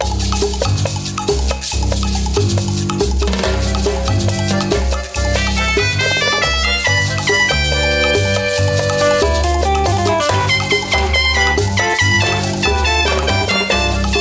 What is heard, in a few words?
pineapple record player